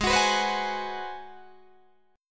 Ab 7th Sharp 9th Guitar Open D, chord

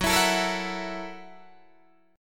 Gb 7th Sharp 9th Gb Guitar Open D

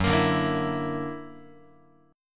Gb Augmented 7th, down, Guitar; strummed; 4A,4E,5A,5D,5G#,6C#

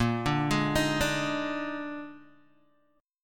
Bb 11th, Guitar, DADGAD

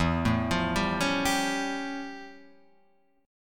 F Augmented Major 9th E

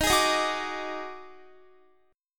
Eb Minor Major 7th Double Flat 5th, Guitar; strummed; 4E,4A,5D,5G,5C,6F

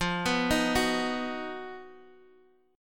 B Major F

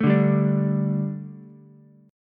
A 7th Suspended 4th, A, down, Ukulele; A7sus4; strummed; JGuitar

